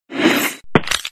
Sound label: scream kill